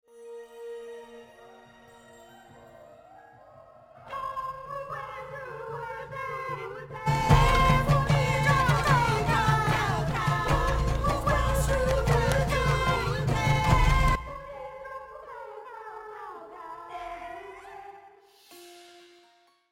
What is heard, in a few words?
Windtrader song